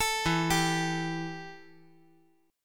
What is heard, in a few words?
E Minor Double Flat 5th E